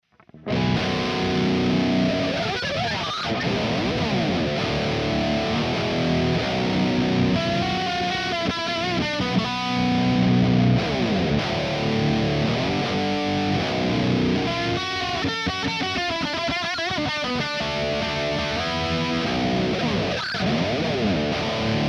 Kemper Profiler Stage Engl

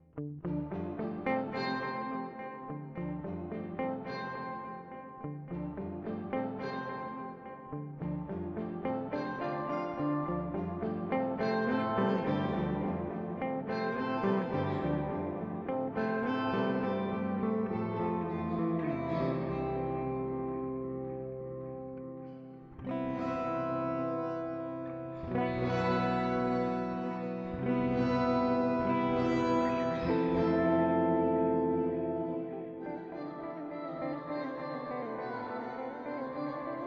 Electro Harmonix Oceans 12 Echo Poly

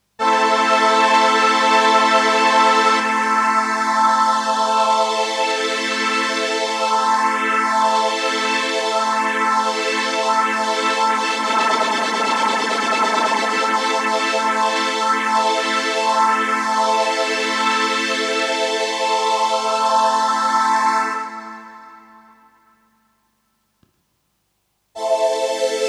Behringer Solina Phaser

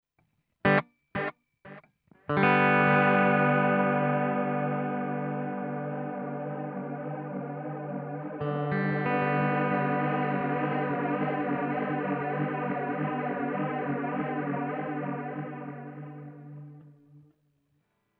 GFI System Orca Delay Ramping

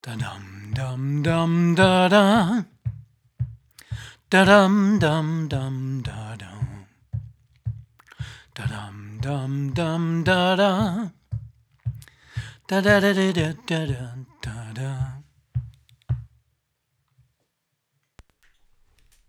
LowCut; GAP-EQ73

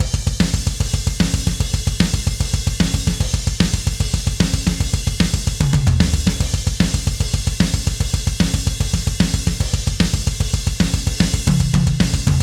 Stereo Mix; EZdrummer 3

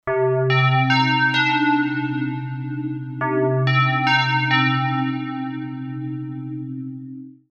hydrasynth synclavier dive

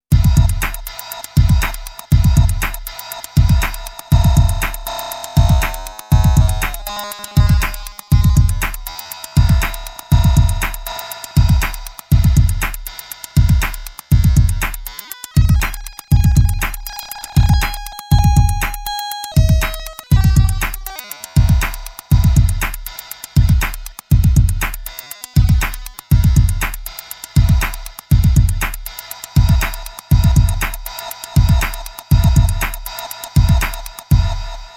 korg volca sample groovebox

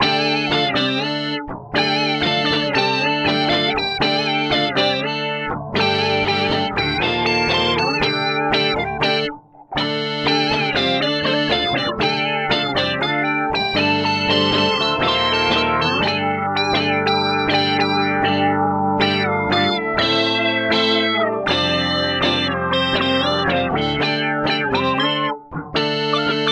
Boss SY 1 LEAD

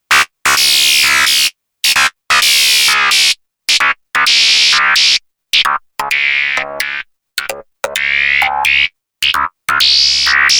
Pro-12 Cutoff vs. Resonance

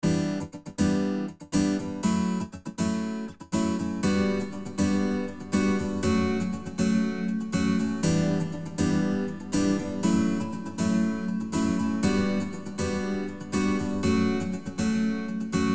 Lexicon Random Hall Guitar

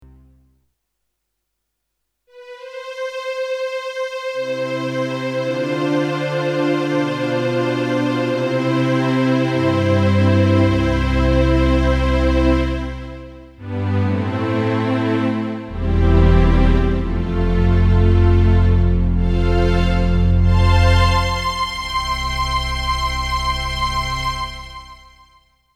Strings

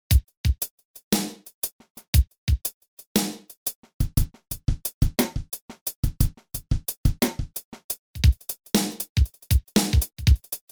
rh shuffle

